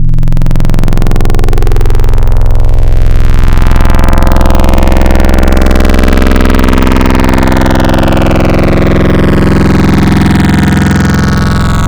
Digi Sweep, wavetables; Cymatics; Wavetables Collection Vol 3; Serum

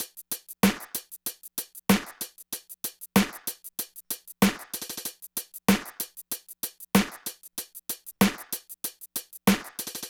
Drums, 095 Emin, Hip Hop

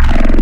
Predator, Wavetables; Cymatics; Wavetables Collection Vol 3; Serum

